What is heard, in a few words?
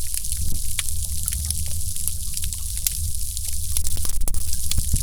Anchorage sounds; Gadji